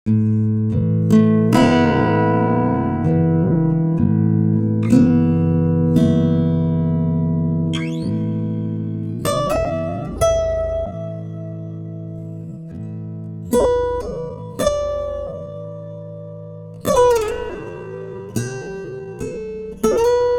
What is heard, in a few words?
guitar, timestretch; ikm sampletank stretch